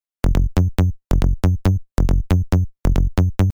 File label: Serum Bass Psytrance